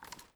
foley, weapons; mas scar; cw20 extras

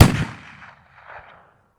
turret shoot shot far

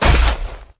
step walk

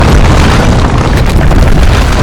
wind tremor loop

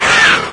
chatter combat; doom3

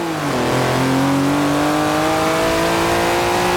third, vehicles cars; sgmcars; 918

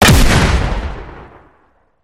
gunstand shotgun fire, turret; gow; turret judgement

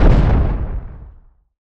step, bear

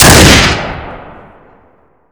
awm, weapons

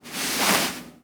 foot slide; nmr zomb shared

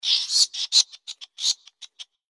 giant rat battlecry; npc

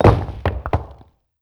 stone

